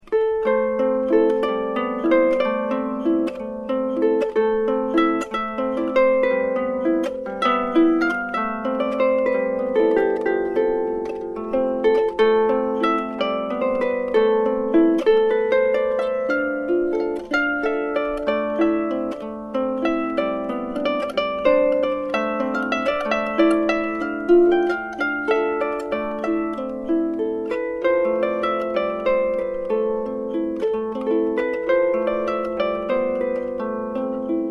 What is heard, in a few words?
22 string harp